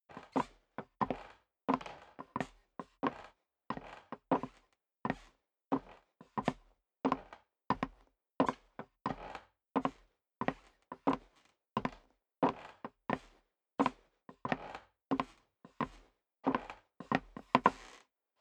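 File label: step boots wood creak walk sequence, footsteps; schritte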